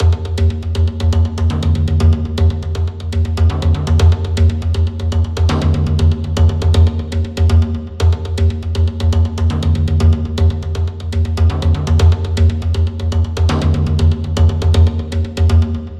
Perc Loop; In Darkness

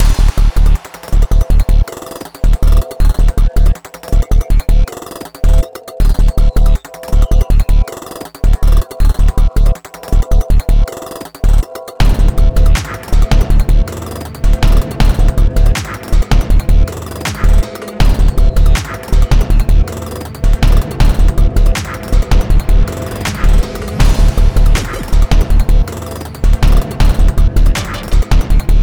CRITICAL ALERT; Flip Flop

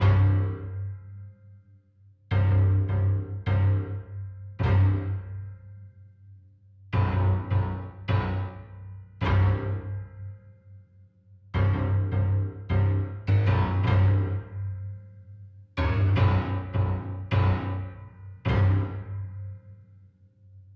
Fight Bass; We Happy Few

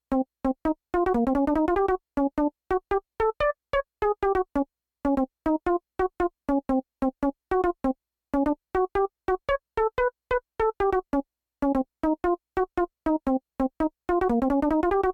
Melody; chippy

